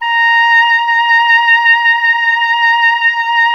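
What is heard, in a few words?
Wind OBOE A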